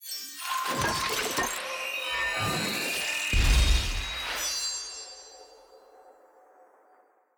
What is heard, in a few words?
capsule upgrade; clash; lol